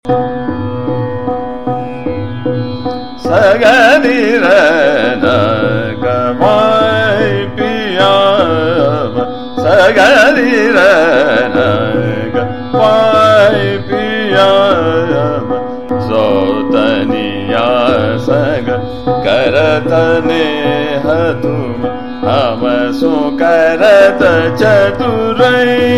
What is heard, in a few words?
Chota Khyal Trital; Malkauns; Sagri Rain Ganwayee